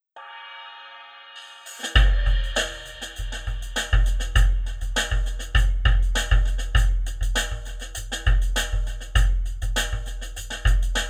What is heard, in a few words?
slingerland drums only; tascam